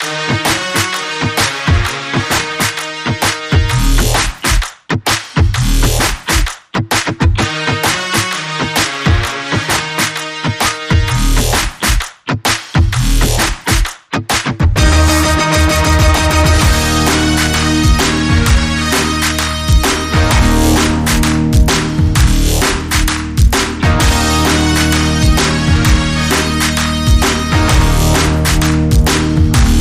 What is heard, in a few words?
No Lead